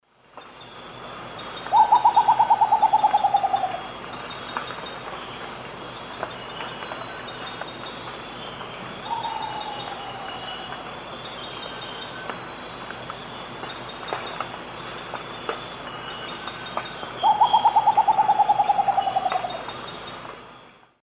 Nyctibius bracteatus, songs 2 birds; S. America; Eastern Lowlands

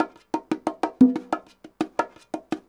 bongos 120; latin; 120bpm

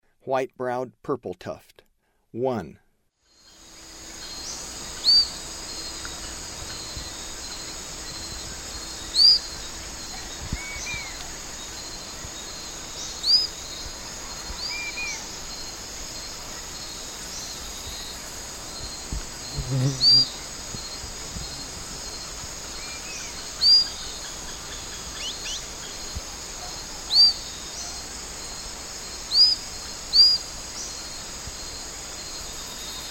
White-browed Purpletuft; S. America; Eastern Lowlands

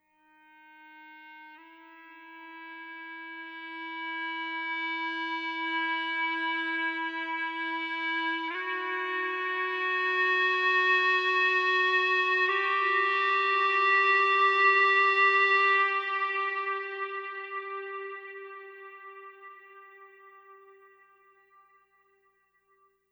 E-bow; Ambient Cinematic; Soundscapes

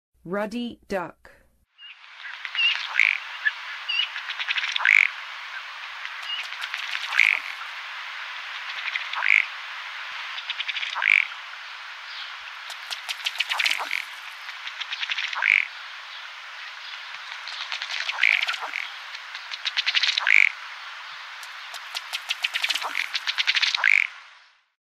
Ruddy Duck; North America

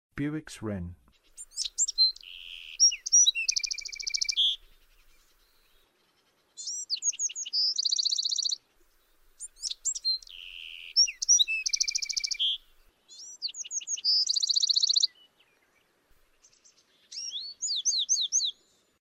Bewicks Wren; North America

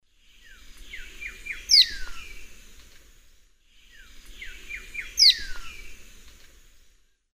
Myiozetetes similis, take-off call; S. America; Eastern Lowlands

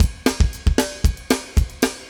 metal

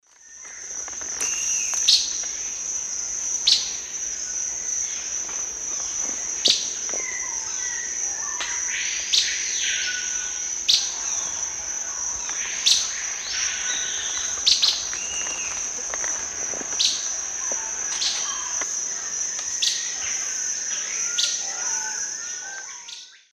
Hylophylax poecilinotus, calls pair; S. America; Eastern Lowlands

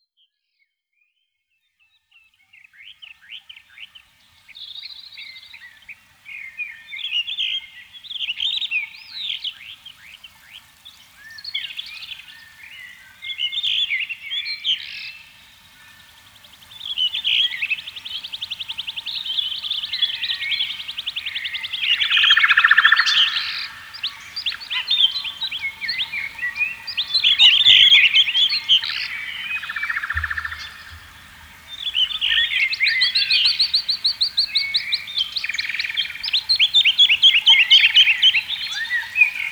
Nature Sounds Canopy Sounds Birds; Nature Chill Out 2014

